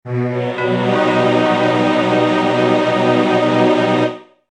Ocean Temple Intro Fanfare; Zelda

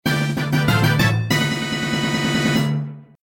Victory Fanfare; Zelda

